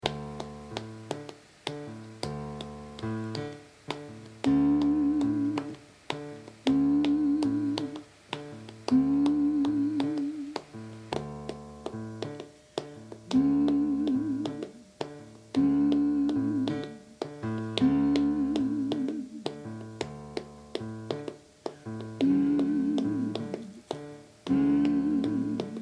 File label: alti, singers; Outdoor-Events; Papa loko